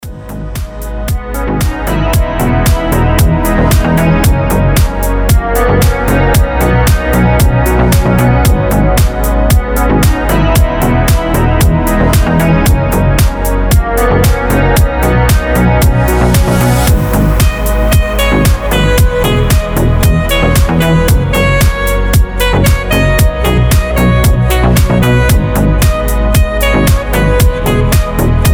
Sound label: saxy sound, Deep house и саксофон; deep house без слов